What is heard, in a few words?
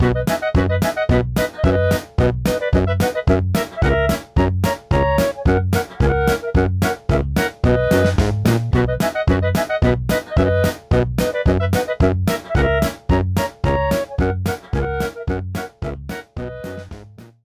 Post-Question Loop; Jackbox Games; The Jackbox Party Pack 1; YDKJ